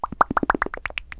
hi pour; Wired for Sound Pro CD; Aristosoft, Inc.

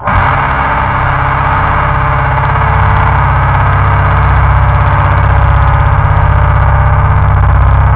dim 2 sus, guitar; da capo vol1

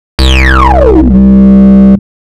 rez bass

